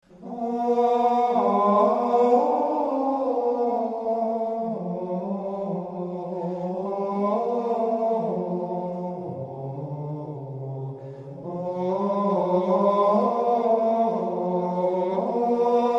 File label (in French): chant